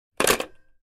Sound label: Phone Put Down